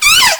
call, Sea Sounds

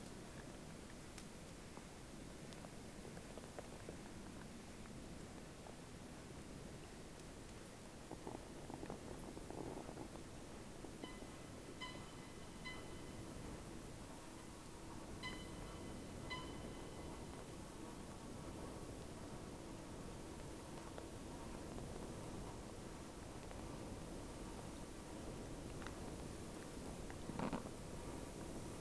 sound, Boat; SeaSnake

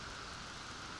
Background Noise

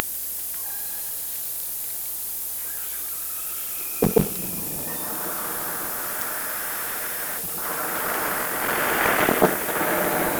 Orca sound Night Passby

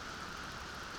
Background Noise

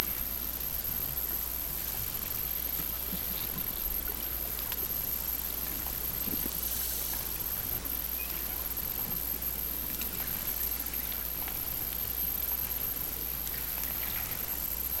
Hydrophones, orcasound

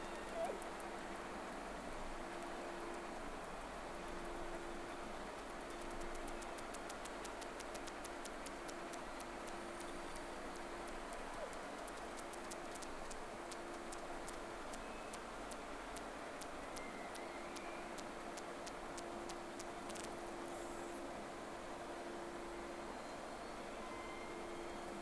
Whales; SeaSnake

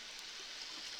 Background Noise Clips

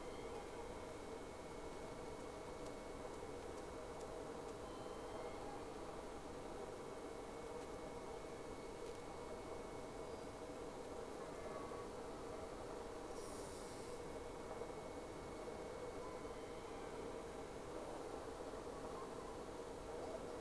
Whales; SeaSnake

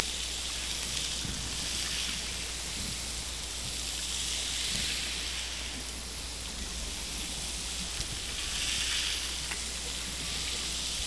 Hydrophones, orcasound

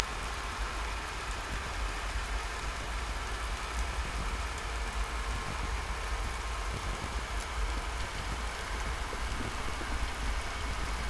hydrophones, lime kiln

